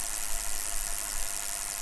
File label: free spin; Matt Hoffman's Pro BMX 2; XBOX